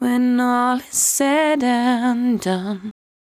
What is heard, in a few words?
74-5bpm B, Velvet Vocal; While U Could; Zero-G